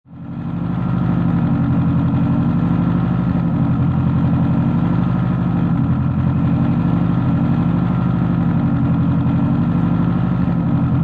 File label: Subaru Sti Engine Idle; Auto, Power Car, High Performance Car Sound; Engine perspective. Idle engine, motor idling, ticking over; Race Car Sound Effects; LynneMusic